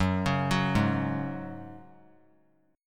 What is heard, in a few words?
Gb Suspended 2nd, 3C,3F,3A,3D; Gbsus2 arpeggiated